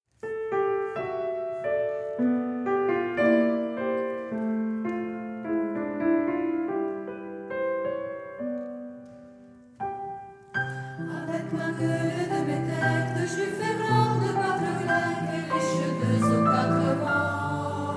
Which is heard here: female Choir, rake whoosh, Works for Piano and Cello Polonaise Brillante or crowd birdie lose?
female Choir